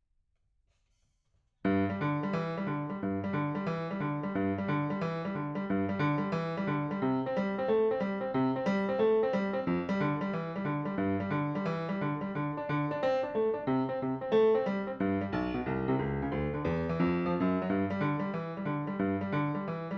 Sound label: Piano, RNR for piano